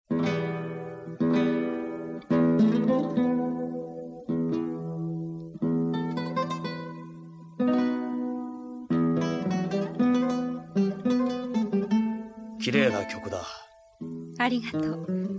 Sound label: guitar